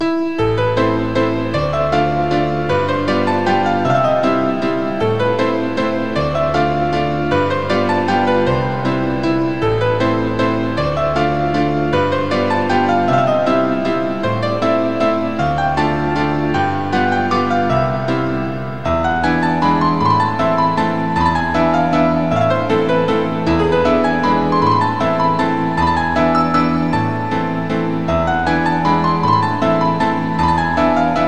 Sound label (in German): walzer a moll klavier solo; chopin